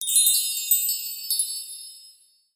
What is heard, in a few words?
bell tree; ambience